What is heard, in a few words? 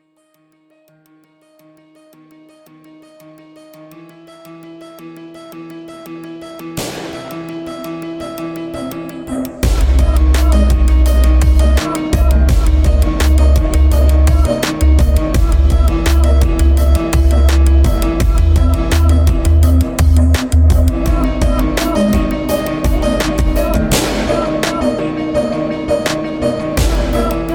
New Beats; New Beats 7